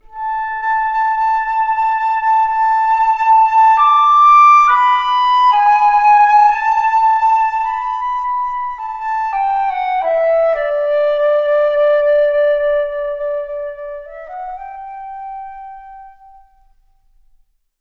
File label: Flute